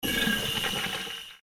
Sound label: flush